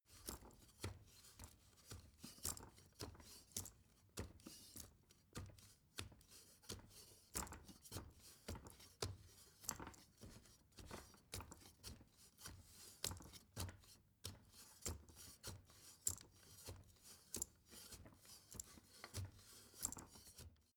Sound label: step ladder wood soft; schritte